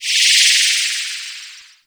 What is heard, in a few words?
Nokko Mushroom Interrupted; Warframe; Nokko Colony; Mushroom Hunt